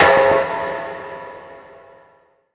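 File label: percussion fx; Best Service Dance Mega Drums; PERC-FX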